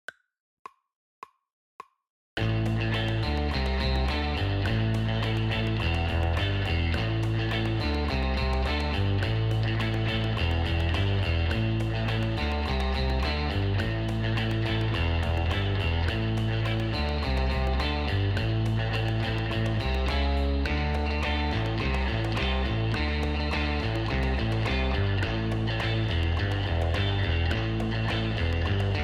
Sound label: drumless